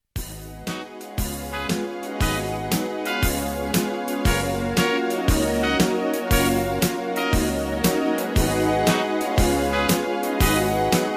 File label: Chord With Style